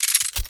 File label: dark gun end